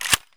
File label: saiga slider up; Escape from Tarkov